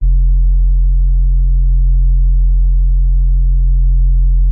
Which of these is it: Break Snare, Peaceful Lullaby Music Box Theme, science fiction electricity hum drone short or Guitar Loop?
science fiction electricity hum drone short